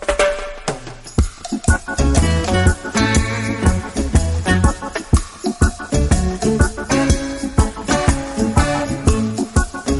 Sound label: reggae